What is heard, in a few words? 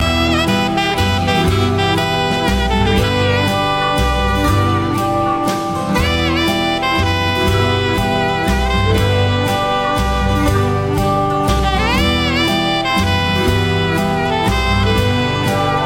waltz street loop